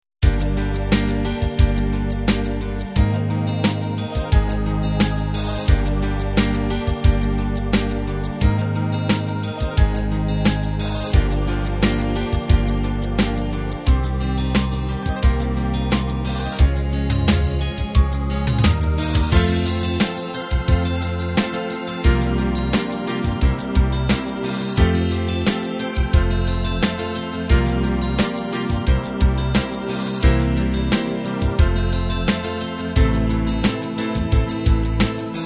cinema ballad

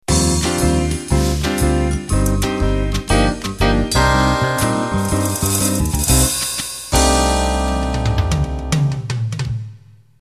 Ballroom Swing End, MIDI

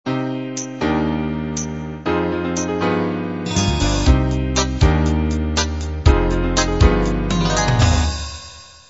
half 8-16beat intro

